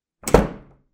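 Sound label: door slam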